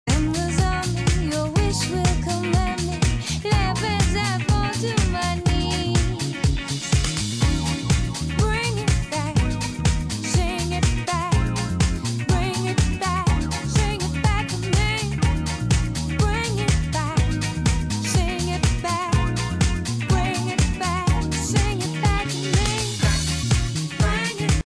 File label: Music